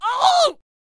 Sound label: Squire Death Vocals